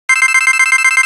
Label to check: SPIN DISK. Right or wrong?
Wrong